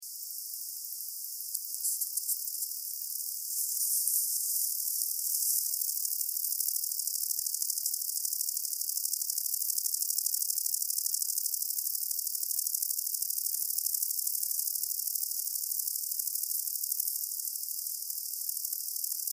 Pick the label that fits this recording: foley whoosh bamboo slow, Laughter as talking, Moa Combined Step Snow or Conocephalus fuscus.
Conocephalus fuscus